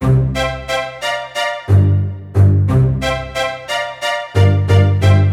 Melo Stacc, strings

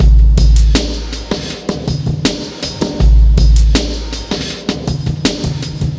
Grungy Beat; ambient vol 2

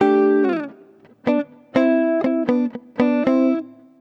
Accomp A, джазовый сэмпл гитары; jazz

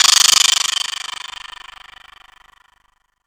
Stutter Crash

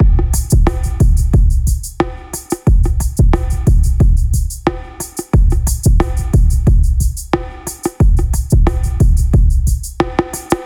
Feather Beats; Ударные и барабаны; Электро Джаз; 130 BPM; Drums

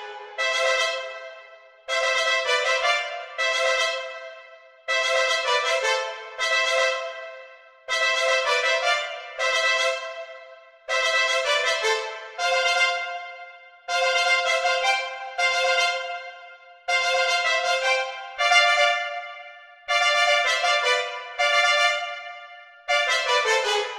Trumpets

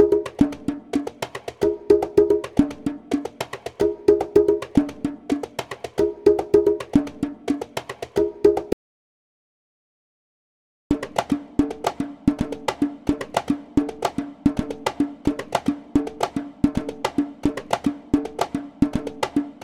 Motherland Congas 110 BPM

